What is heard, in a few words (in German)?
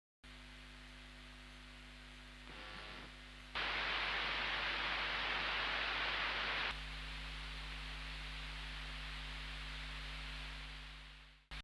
Rauschen Off